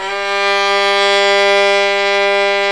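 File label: violin tenuto G 2; AKAI S-Series CD-ROM Sound Library VOL-7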